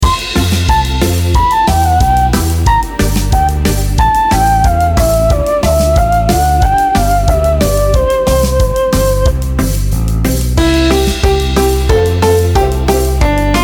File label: karaoke